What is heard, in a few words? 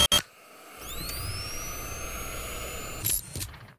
medical station; casualtiesunknown